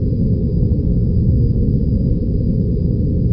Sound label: engine